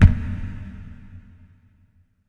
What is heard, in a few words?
SHORT, SHORTNOIS